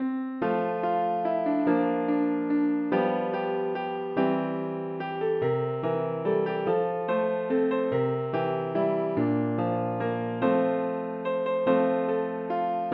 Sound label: piano